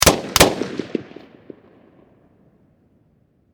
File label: Warfare Machine Gun M16 Double Shot Close Perspective